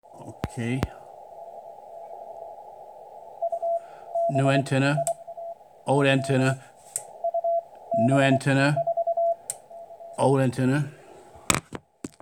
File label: 18m Signal Comparison